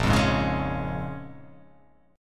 A sharp Minor 7th, A sharp, down

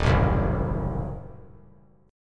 D sharp Diminished 7th D sharp; strummed; D#dim7; 3,4,3,4; JGuitar

